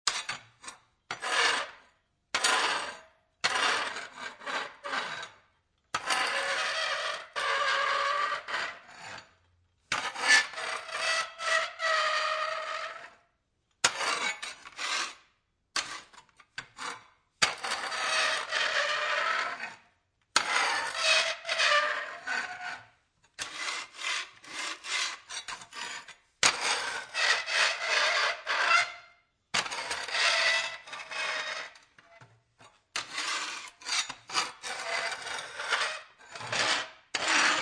Foley, Pitchfork, Metal Pitchfork, Scrapping On Concrete, Many Scrapes